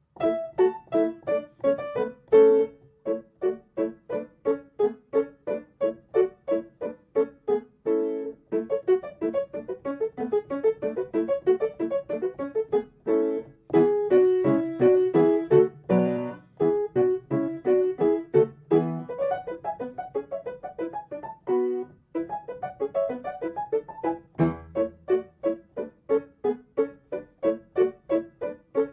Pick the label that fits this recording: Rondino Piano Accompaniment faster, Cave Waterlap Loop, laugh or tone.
Rondino Piano Accompaniment faster